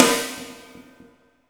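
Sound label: snare drum; Zero-G; Chemical Beats; SNARE ZOO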